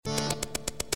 Click

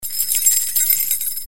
Jingling

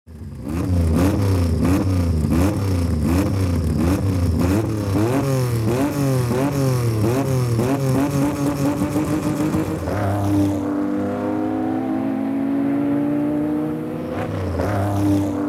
Porsche 956C

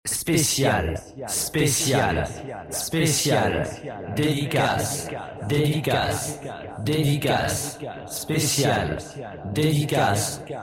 Jingle

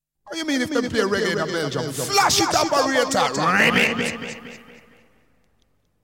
Jingle

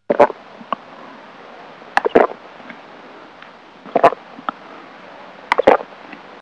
Drinking

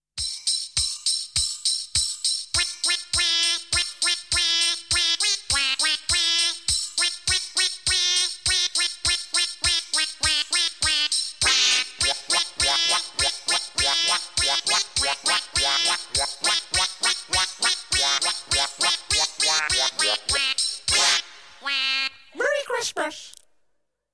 Jingle

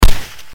Ric gun

